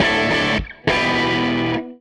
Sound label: Gretsch Guitar Loop; Sonic Foundry (Sony Creative Software); Six-String Orchestra